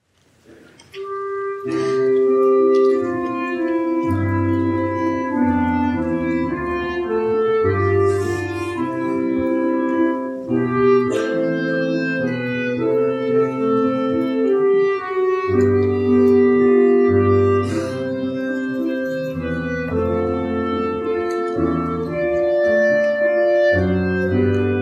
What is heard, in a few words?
clarinet